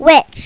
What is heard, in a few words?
WITCH